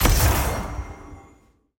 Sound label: ui items button click